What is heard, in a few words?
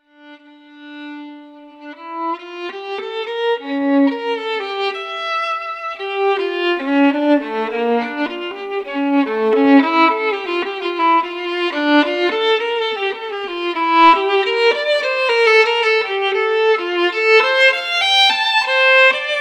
Solo Violin; se electronics rnr1